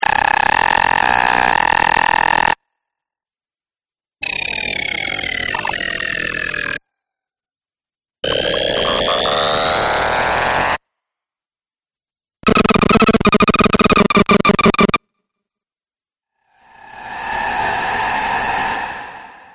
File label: Glitch Scratchy Sounds Collection; Twistedtracks